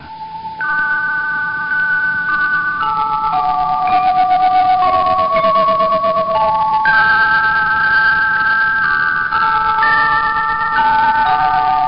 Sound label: jingle